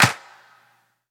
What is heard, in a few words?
Claps; Vengeance Samples